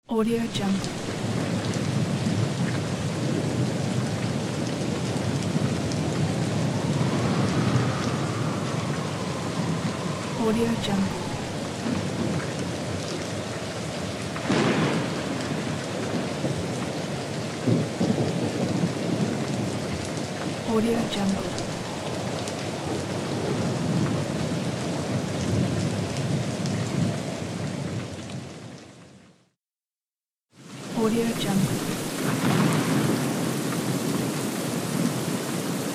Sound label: rain wind and thunder; djsapa